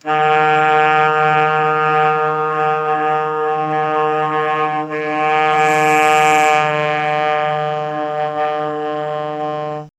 alto saxophone, jazz

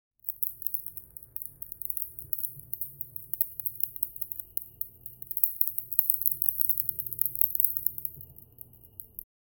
delicate egg cracking

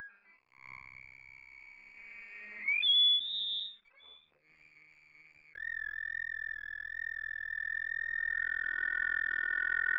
playful goblin like alien

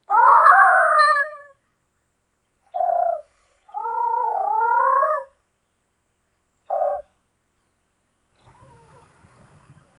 tiger

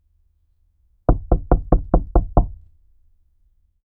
knocking walls wood medium

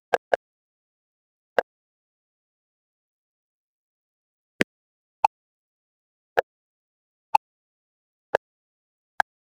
a soft satisfying button, button-click

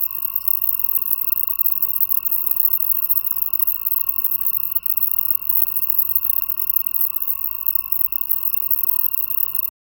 ambience dripping water, mist

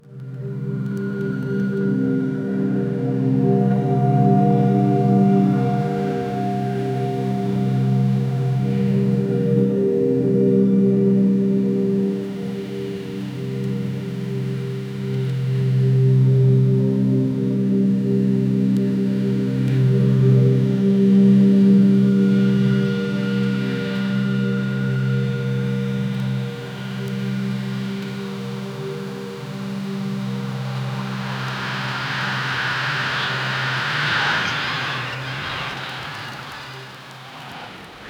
rhythmic ocean waves

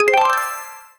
puzzle combo rising cryst, arpeggio

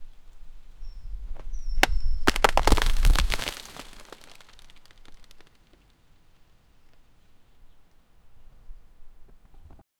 heavy tree falling on the

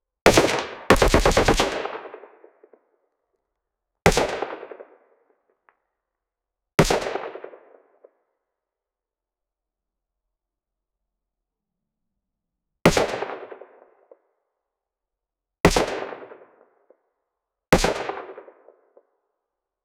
succinct m4 single gun

